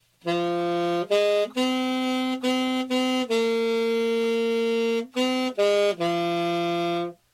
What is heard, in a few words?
alto saxophone